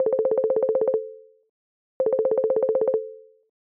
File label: call, ringtones